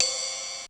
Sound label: Casio RZ-1